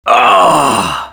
die, male; csco; csgo; zbm3

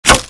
tactical wood; csco; csgo; zbm3; tacticalk

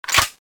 after reload, weapons; mosin; csco; csgo

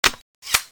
railgun clip in; csco; csgo

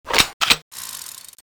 speargun clip in; csco; csgo

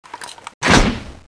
shoot, weapons; csco; csgo; skull8

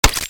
smg clipout; csco; csgo; weapons; sfsmg

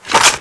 bazooka clip in, weapons; csco; csgo

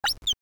sniper zoom; csco; csgo; weapons; sfsniper

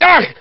hostage pain, hos pain; csco; csgo

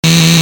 chainsaw attack loop; csco; csgo

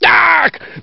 hostage pain, hos pain; csco; csgo

